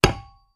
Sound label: Metal Impacts, Dull; Dull Light Impact With Ring Out. - Construction; pipes